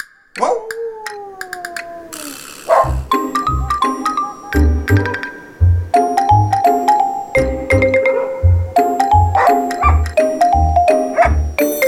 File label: Howling Halloween